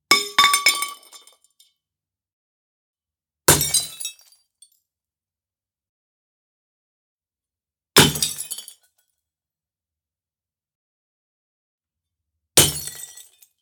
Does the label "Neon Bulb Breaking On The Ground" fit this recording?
Yes